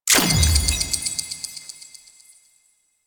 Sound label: Time Pickup; Cephalon Tear; Warframe; Cephalon Weave